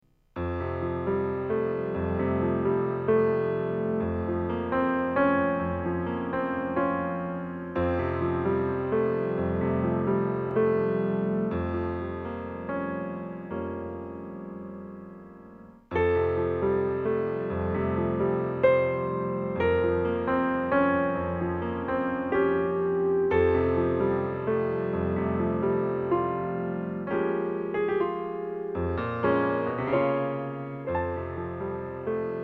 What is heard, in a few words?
Piano Version; Deadly Premonition